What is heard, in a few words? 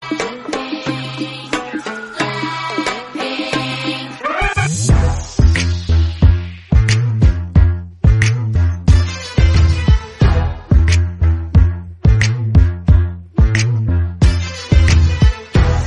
Instrumental